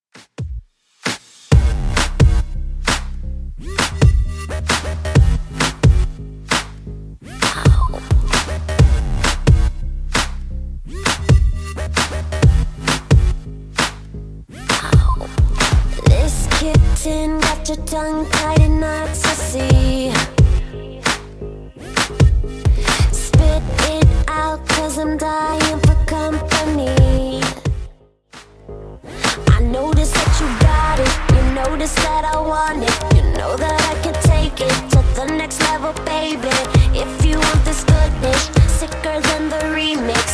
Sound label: 2ks Redrum 132 bpm